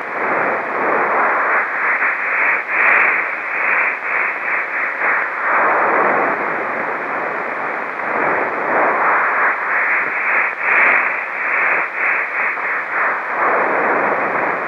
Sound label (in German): windkraft, elektrosound